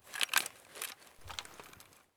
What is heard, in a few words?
mr133 draw; Escape from Tarkov